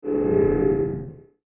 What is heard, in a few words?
Laser Scythe, science fiction sound effect; Laser Sound Sound Effects; star wars / trek style